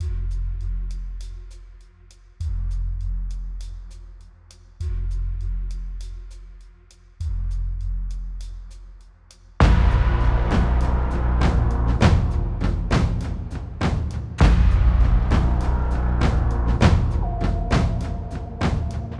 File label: Drums Cinematic Tones Evil Empire 100